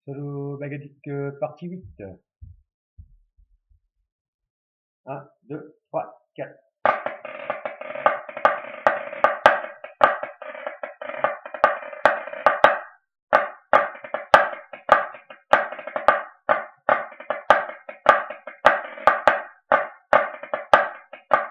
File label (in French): SOLO BAGADIG